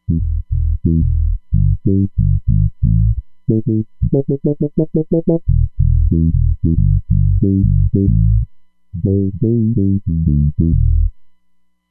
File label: Deep Funk Bs, Roland Fantom XR